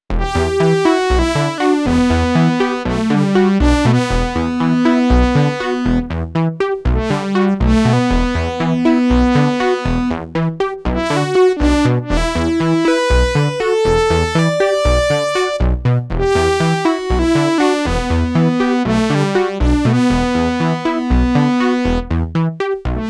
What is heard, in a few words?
ARP2600M Spring vs IMP vs IMP vintage 2600